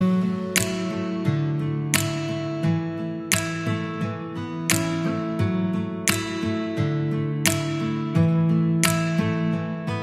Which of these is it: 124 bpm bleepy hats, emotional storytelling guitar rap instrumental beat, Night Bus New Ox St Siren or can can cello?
emotional storytelling guitar rap instrumental beat